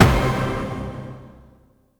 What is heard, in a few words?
STAB